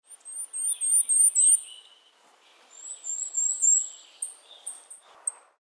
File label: WARBLER CAPE MAY, birds; species/birds; wbba